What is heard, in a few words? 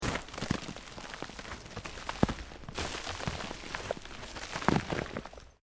EARTH LOOSE MOVE LONG, Debris; Material components for constructing more complex sounds; Materials